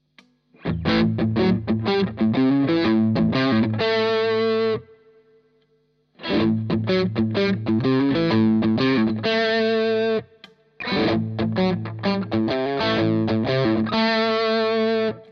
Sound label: OD RnR